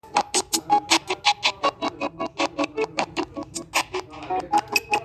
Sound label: noise in speaker sound; note 12 4g